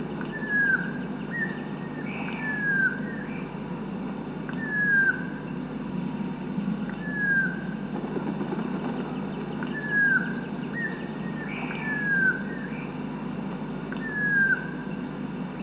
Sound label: chough